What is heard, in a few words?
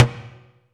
Toms